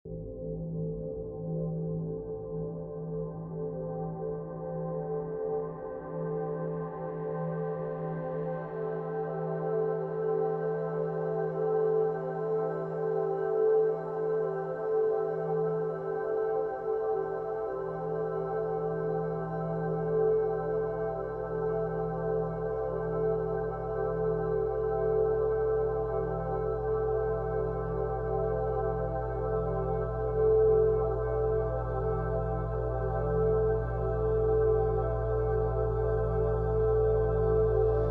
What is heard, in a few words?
444Hz 55.5Hz Epsilon; Epsilon Waves